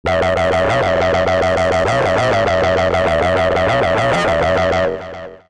Synth Mono

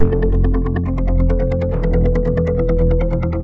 Synth Poly; TSMtoolbox

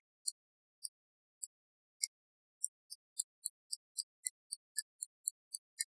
Castanets Violin Applause

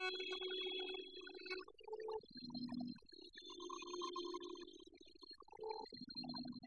Castanets Violin Applause

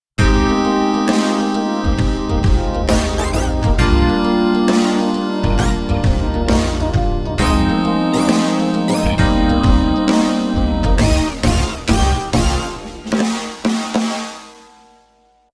Pop; TSMtoolbox

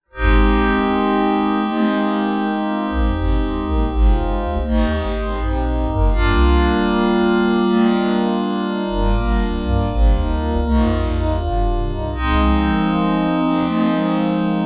Pop

